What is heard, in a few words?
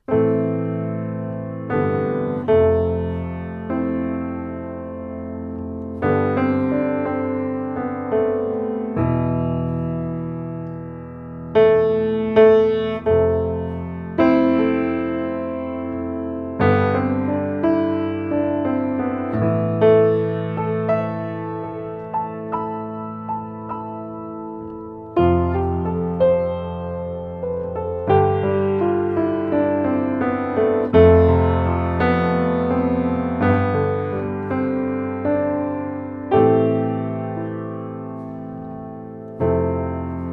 Piano